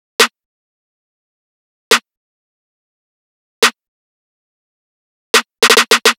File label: Riddim Drum Loop Snare (140); Ghosthack; Riddim Essentials 2020